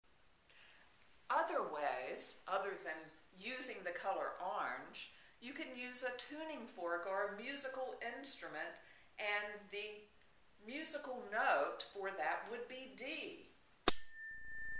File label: Tuning Fork; Part 2 Spleen; Chakra Healing